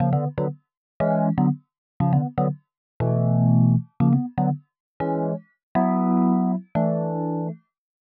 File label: 120 Rhodes Riff Chords E, Keys, Nu Jazz House; PowerFX